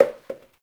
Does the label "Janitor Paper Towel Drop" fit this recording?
Yes